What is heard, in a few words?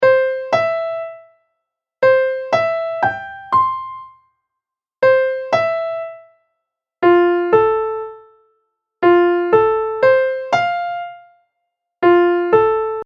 M3 Arp Example; Interval Ear Training